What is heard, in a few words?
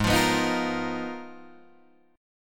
G sharp Minor 6th Add 9th G sharp Guitar Drop D, chord